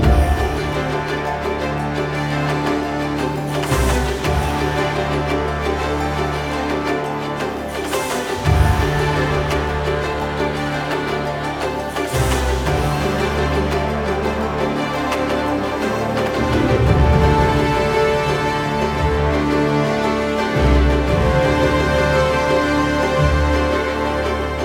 Combat 114BPM

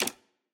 Safe Click; Puzzle

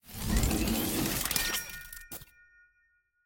Turret Success

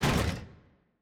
Chomper Slide Stop; Puzzle

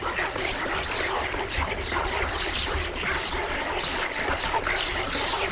Enemy Boss Whisperings; bloom minigame

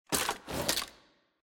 Board; Ekkos

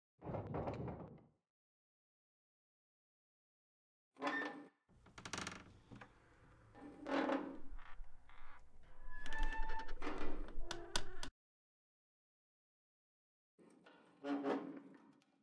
Roof Railing Creak

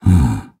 Nonverbal Happy; Ornn

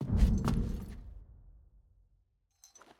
Arm Enter; Puzzle

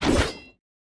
Attack Light; bloom minigame

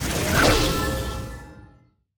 Enemy Boss Teleport Out; bloom minigame

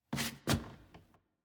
Footstep Wood Rotate

